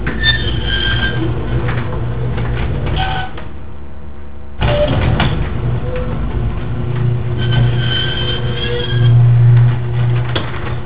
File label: dry center torsion bushing, garage doors